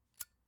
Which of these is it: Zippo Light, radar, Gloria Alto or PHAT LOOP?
Zippo Light